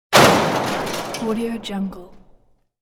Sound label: crash metal sweetener distant